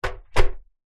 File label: household washing machine door close internal inside